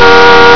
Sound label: CAR HRN